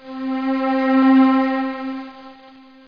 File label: symph; SimCity2000 Interactive Demo; 10SYMPH